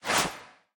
swish small; smite; god artemis

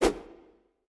Whoosh; smite; izanami

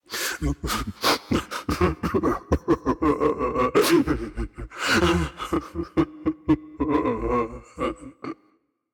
boohoo; smite; osiris

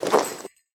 armor light; smite; chiron

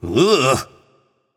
"ugh"; smite; voicepack; janus

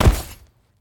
bodyfall; smite; god gilgamesh

